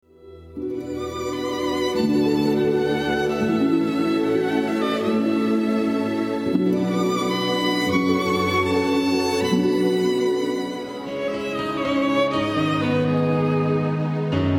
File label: Medley New Age Celtic